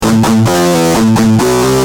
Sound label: G Jam, Guitar; Industrial